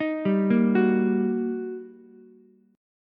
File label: G Minor Major 7th Sharp 5th, down, Ukulele; GmM7#5 arpeggiated; JGuitar